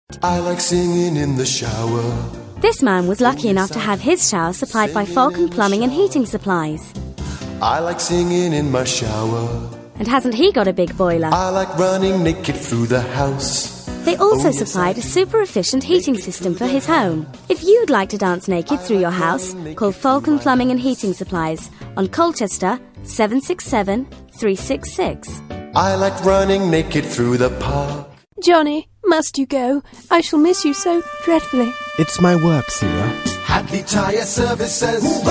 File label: singing comedy